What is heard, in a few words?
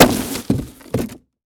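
destroy cardboard small, explosions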